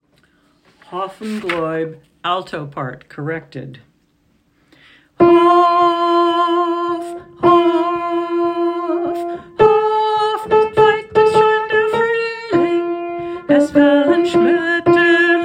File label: Hof Un Gloyb alto part, chorus; A Besere Velt - Yiddish Community Chorus of the Boston